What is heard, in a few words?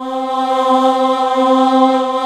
CHOIR; B CHOIR